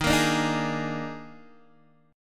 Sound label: Eb Major 7th Suspended 4th, Guitar Open D; x,6,8,8,6,6; down